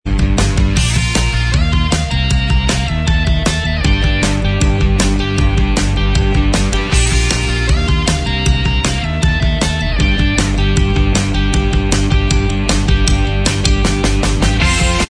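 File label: instrumental, backing tracks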